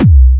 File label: kick sample; retrologue 2